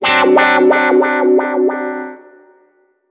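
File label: guitar, wah-wah, disco; Best Service ProSamples vol.48 - Disco Fever; AKAI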